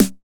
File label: SNR 909, Roland